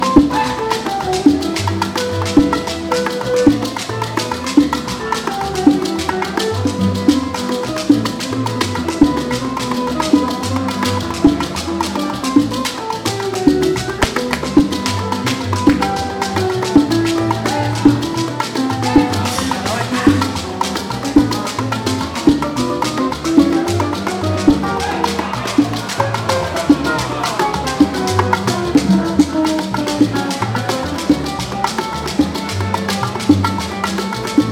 Live Band In Havana

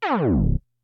Tape Slow

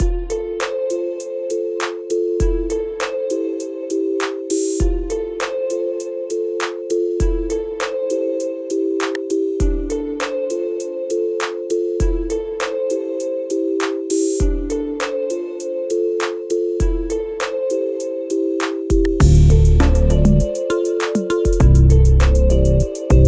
Melodic Beat

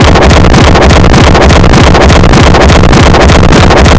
Noise

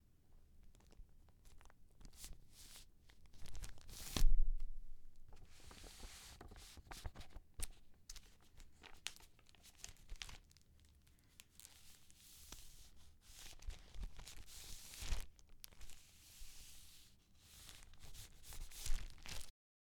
Paper Sliding Into Pastic Sleeve Sound

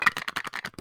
Bricks

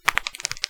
Knuckles Crack

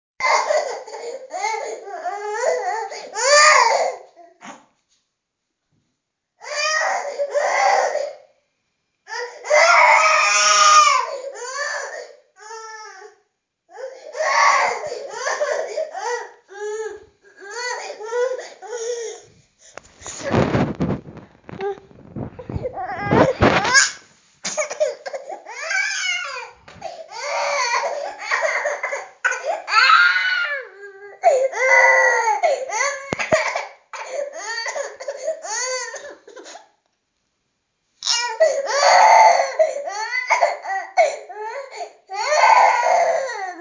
Angry Baby

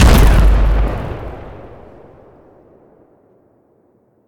Explosion Near

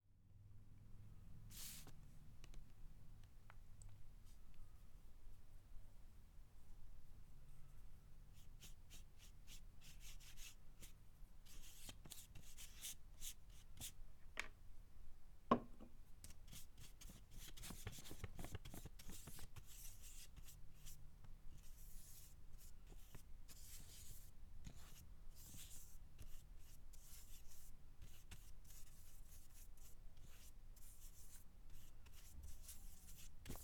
Painting With Brush

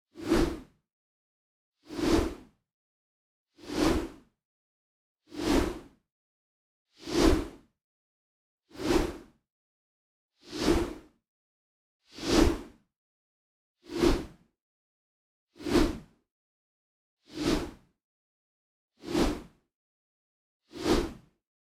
Swsh Swish Of Heavy Stick Artificial

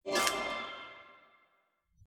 Magic Item Being Grabbed

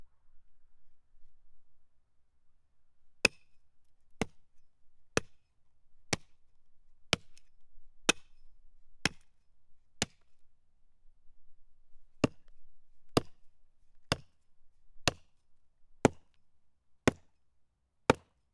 Axe Cutting Wood

